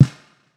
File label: Snare Lo-Fi; Drums; Ghosthack - Free Snares 2023